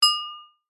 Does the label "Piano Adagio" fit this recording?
No